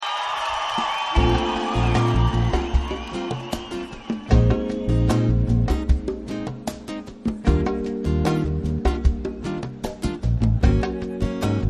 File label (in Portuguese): samba